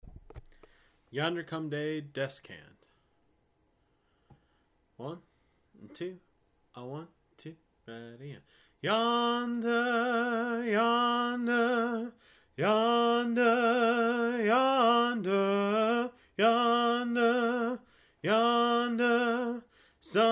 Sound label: Descant, choir